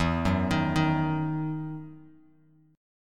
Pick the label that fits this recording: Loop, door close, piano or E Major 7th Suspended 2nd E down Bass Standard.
E Major 7th Suspended 2nd E down Bass Standard